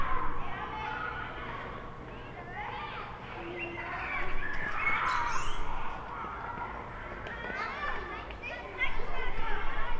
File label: screams